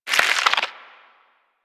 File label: Symbiotic Link Loop End; Warframe; Warframes